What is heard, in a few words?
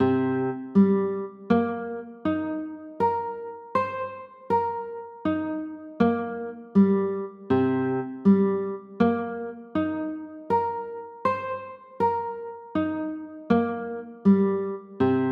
c m7, chords